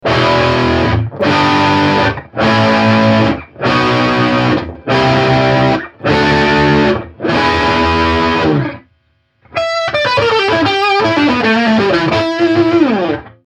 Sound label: paradox alumin bridge drive